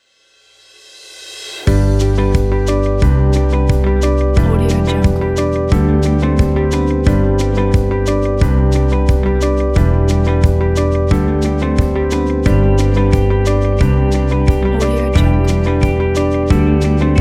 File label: upbeat folk